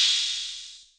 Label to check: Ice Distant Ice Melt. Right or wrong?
Wrong